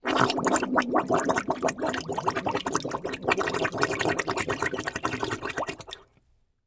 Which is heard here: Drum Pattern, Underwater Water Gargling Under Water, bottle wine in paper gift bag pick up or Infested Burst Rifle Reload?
Underwater Water Gargling Under Water